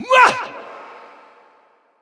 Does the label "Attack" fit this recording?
Yes